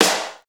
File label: Snare, Fairlight CMI III